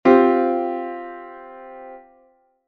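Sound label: Csus4 chord; ジャズピアノ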